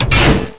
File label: Large Switch; Pushing a large switch